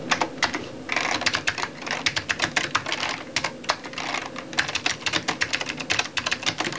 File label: KEYBO